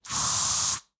Aerosol Can Spray

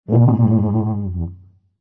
TUBA COMEDY

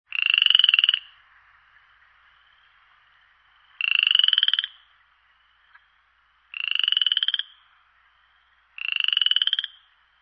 ANIMAL FROG

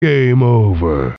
game over

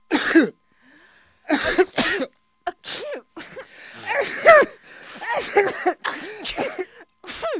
people

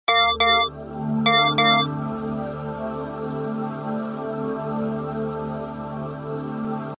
ringtones nokia

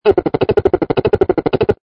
ANIMAL CALL

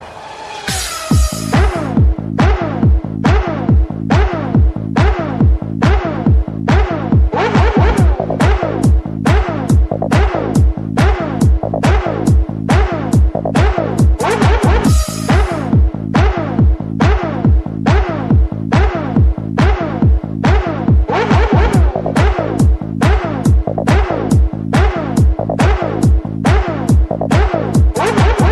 techno; formula 1

